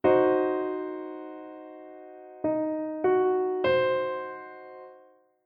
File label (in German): Dreiklang Dim Umkehrung